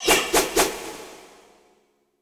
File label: SE STAGE CLEAR ROTATE